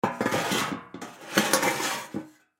Metal Building Material Large Set Down; Industry

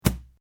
Suitcase Latch Close; Travellers case; Latch Sound Effects; Latches (Sound Effects:Objects)

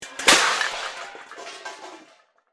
Crash Metal Pots, Crash, Metal, Pots, Pans; Crashes (Sound Effects:Objects); BlastWave

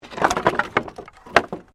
Wood Movement Medium

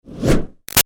Fire Torch Whoosh Fast Short; Flames; Slow Moves; Objects; Big Room Sound

